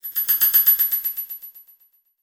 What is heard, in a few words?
Title Transition Delay Short